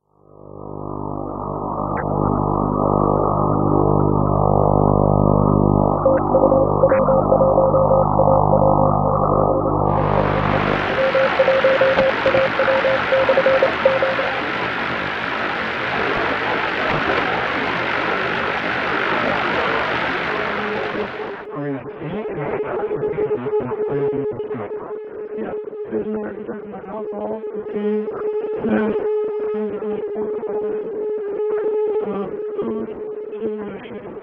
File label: radio sounds